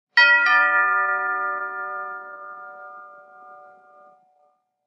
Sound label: Door Bell Tube Bell Ch